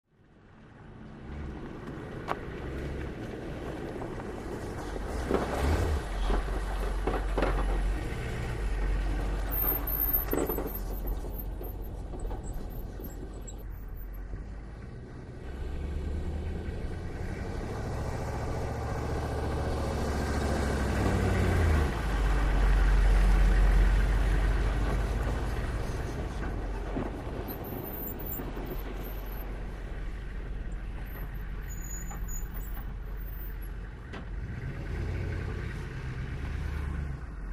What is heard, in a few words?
Humvee: Various Driving Maneuvers.